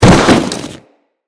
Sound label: Fall, Foley; Characters; Tenno